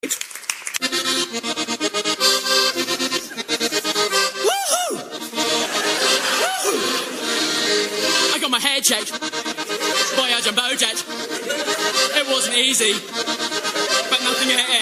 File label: Song 2 on Accordion